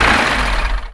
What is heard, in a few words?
motor stop